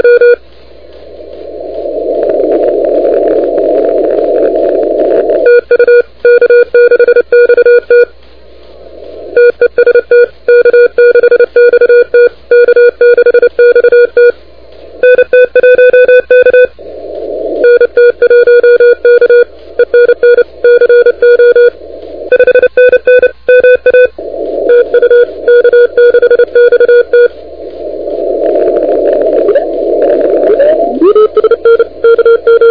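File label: continuous wave 28MHz; ARRL 10M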